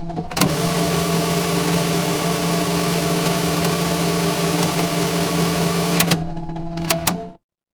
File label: mechanical machine